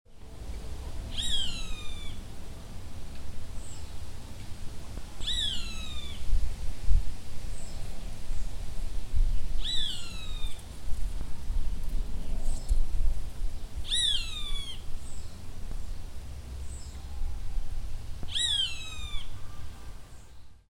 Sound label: Rupornis magnirostris